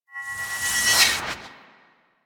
Melee Heavy Attack Charge, Weapons Melee Whooshes; Warframe; Heavy Attacks

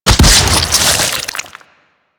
Cyst Explosion Single; Warframe; Infested Eris; Destructable Cysts

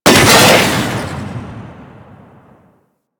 Arch Cannon Fire 3D, Weapons; Warframe; Archwing

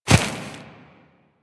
Ram Step Run Grass; Animals; DE; Warframe; Quests; Duviri

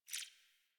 UI Default Theme Tooltip Section; Warframe

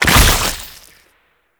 Infested Bone Blade Mortar Fire; Warframe

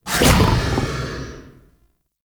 Pit Monster Spit; Warframe; Infested

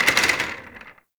Office Chair Impact

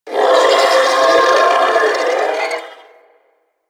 Sentient Symbilyst Attack; Enemies; Barks

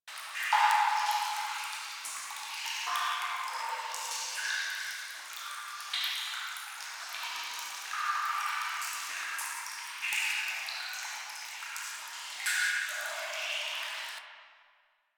Lair Long Hall Drip Loop; Warframe; Ambience; Sentient

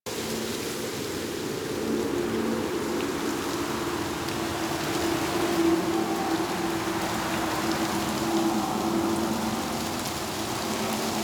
Forest Exterior Eerie Breeze Amb; Warframe; Ambience; Grineer Forest

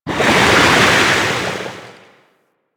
Shark Tail Move Slow; Warframe; Grineer Ocean Shark

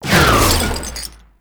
Scaldra Butcher Sickle Heavy Combo

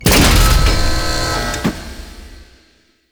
Galleon Platform Start; Warframe; Grineer Galleon; Vor Galleon Fight

